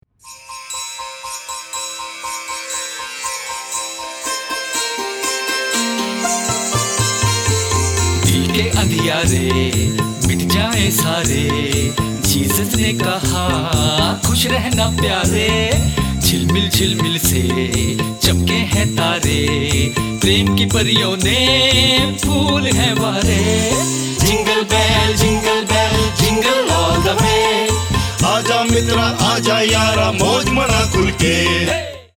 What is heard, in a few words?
Jingle Bell, Ringtones; Christmas